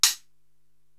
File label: HH Old Pop Close, Korg TR-Rack drum machine